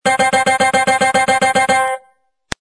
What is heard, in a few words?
rotate; slotsfruitex